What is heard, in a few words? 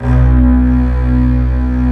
STR STRING, Orchestral Strings